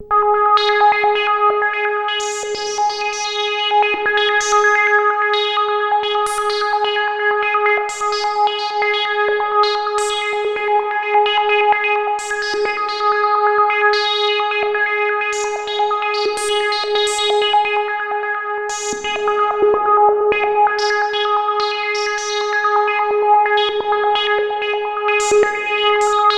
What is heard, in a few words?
ORGAN SYNTH